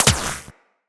Shield Impact; Tenno

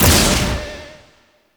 Proj Hit; Warframe; Lizzie

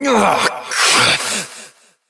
Evil Twin Doppelganger Frustration; Battle Barks; Warframe; EntratiDoppelganger

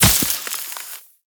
Proj Tap Impact Ice; weapons; Warframe; The New War; Omicrus

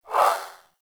Swing, Weapons Melee; Warframe; Modular Melee

